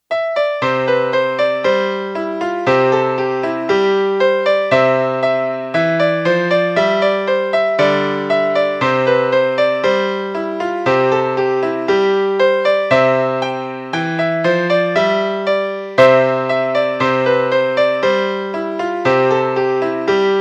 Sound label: Turkey in C melody